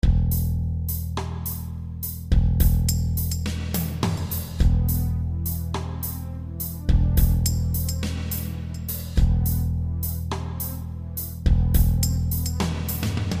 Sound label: drums